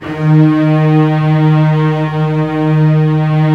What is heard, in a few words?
STR SEC, STRINGS; Roland - LA Composer vol. 1